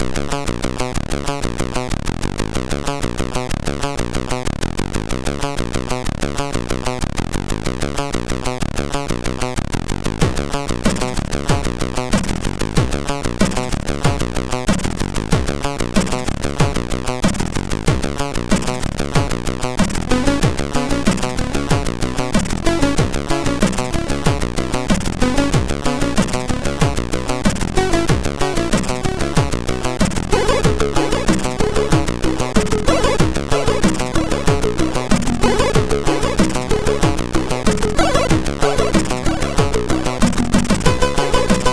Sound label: sid MOS6581R2; Smash TV